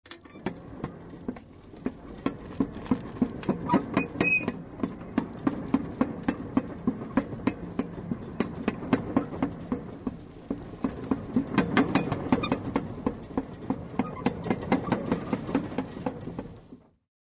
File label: Engine Cover banging and squeaking